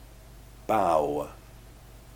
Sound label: bow